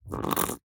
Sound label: aggro, fox; mob; minecraft